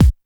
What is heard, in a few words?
R+B KICK; Drums In Your FACE!; BONUSKIK